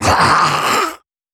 zombie attack

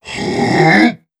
voice monster

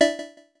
cursor

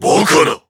monster damage, voice

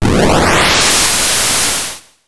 fire breath

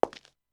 Footstep low

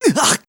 voice damage

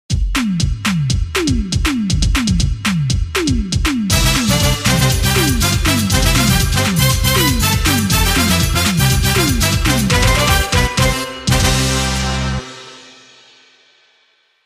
jingle

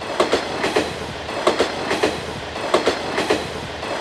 train loop

